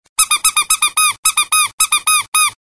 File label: Toy Tone